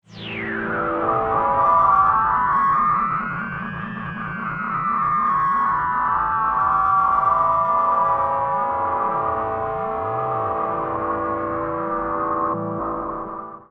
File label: SPACE SHIPS; SpaceSounds